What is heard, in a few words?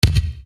Bass Dr, EuroDance Bdrums; Best Service; Ethnic Journey Sound Cube; EuroDance Essentials